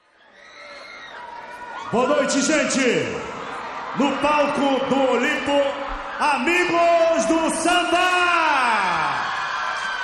samba; samba pagode

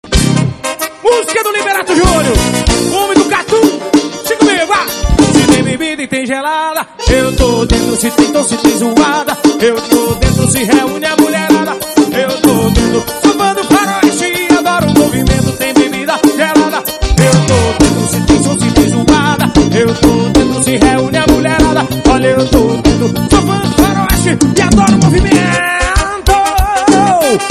forro

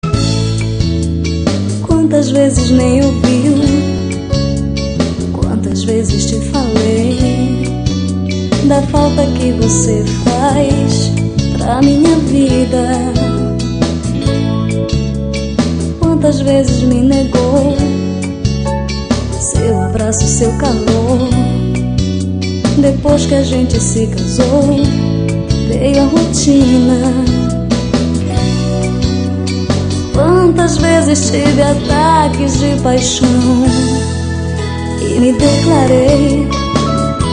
forro

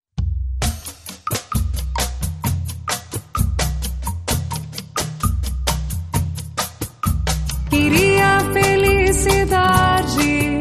samba

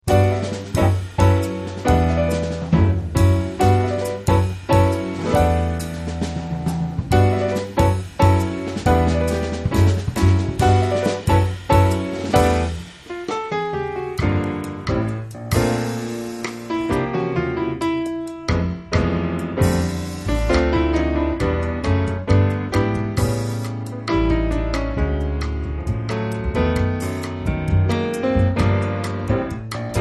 trio samba jazz

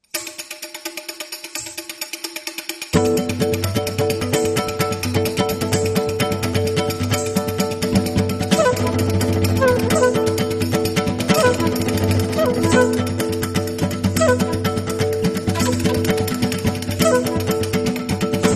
samba tzigane

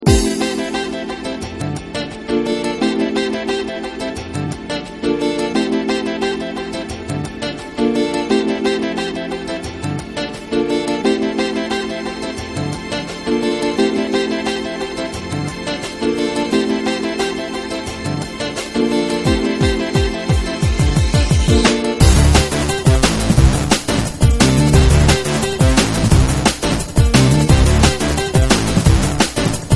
electronic drumnbass brasil

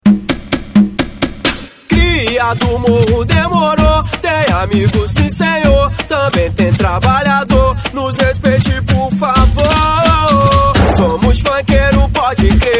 funk mix

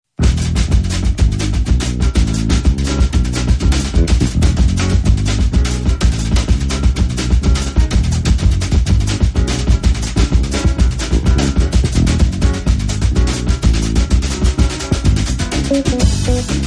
samba no balanco do jazz

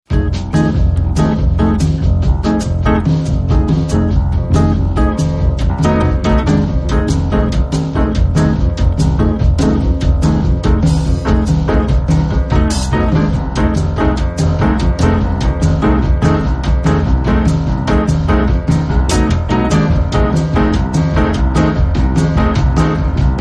samba no balanco do jazz

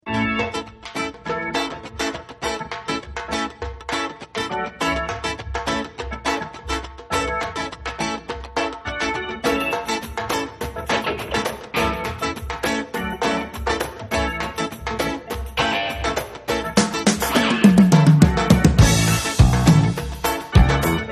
mpb samba

